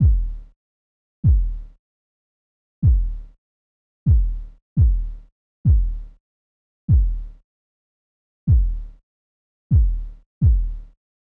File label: Kick, 085 Bmin, Drum Tracks